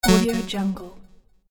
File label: jump sfx video game